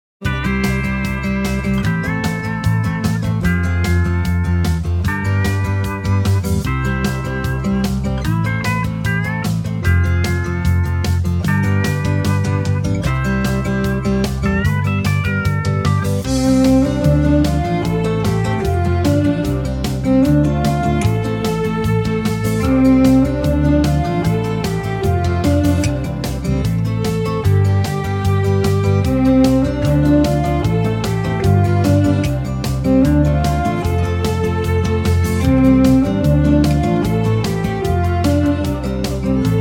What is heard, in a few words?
Jamming The Music